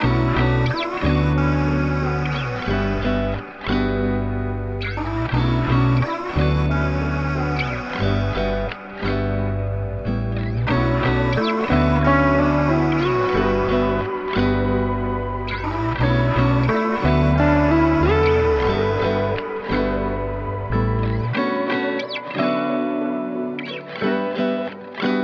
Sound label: 90 BPM B Min, Guitar Loops; Madame; Cymatics; Orchid Sample Pack